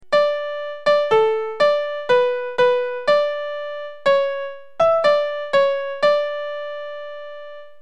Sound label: calypso light